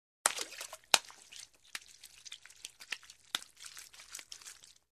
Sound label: Human Washing Hands; Hollywood Edge Foley Sound Library